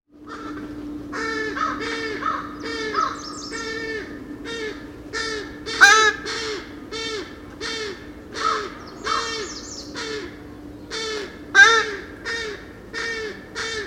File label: America Crow; Cornell